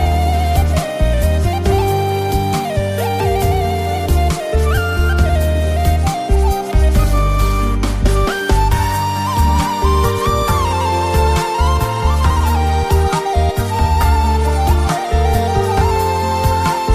iranian music

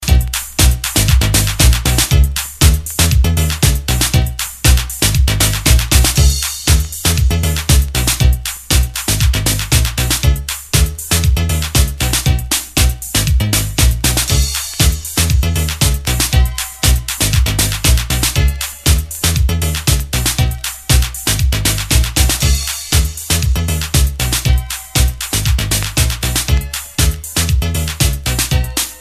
soul

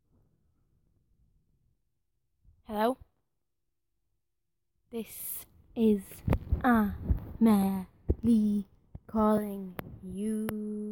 Is Calling Pop

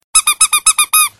rubber ducky; ringtones; sms

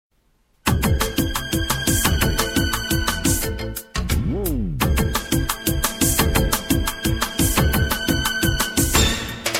funny instrumental

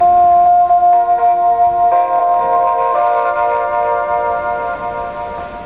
MAV szignal signal

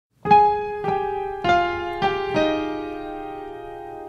5 Simple notes

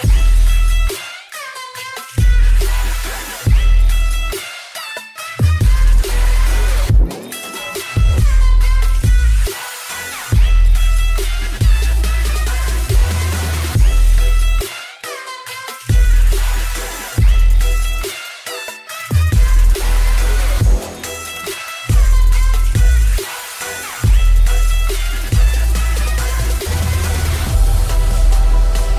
Trap Bass

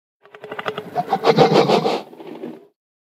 Growl; Indominus Rex

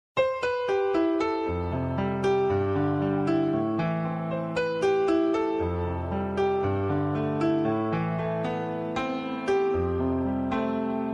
piano; blues

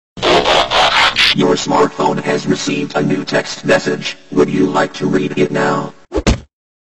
msg ton; transformers

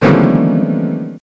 Shock

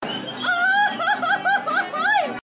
Yell

